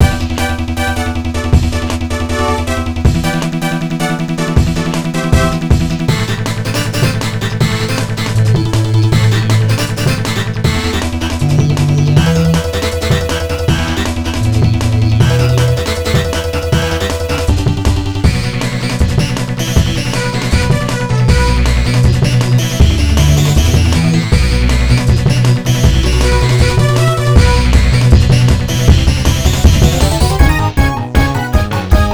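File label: background music dance; My Frogger Toy Trials; Nintendo DS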